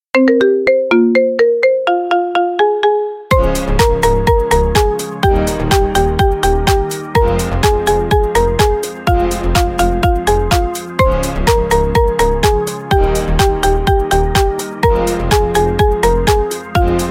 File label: marimba remix